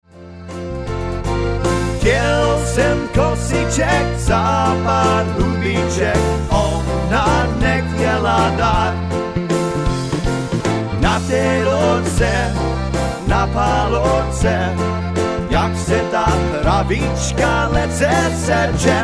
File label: Waltz